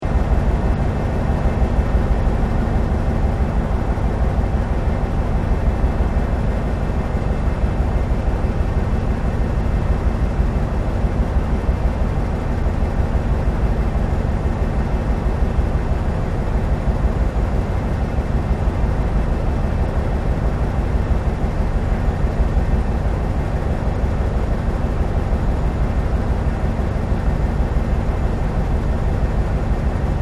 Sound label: Ship Large Car Ferry Engines